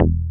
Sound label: DR. LOOP, BASS; X-Static Goldmine 3